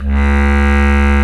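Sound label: bass clarinet, Orchestral Winds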